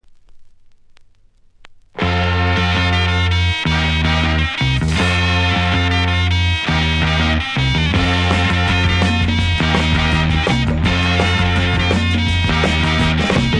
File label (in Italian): ritmo pile